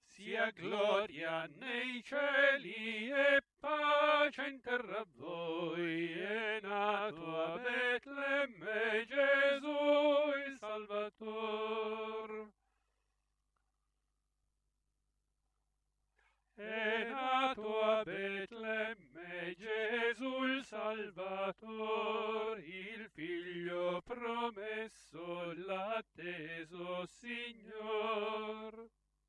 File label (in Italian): Sia gloria nei cieli - Soprani; Veni Emmanuel